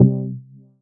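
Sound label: C chord Fifths Stab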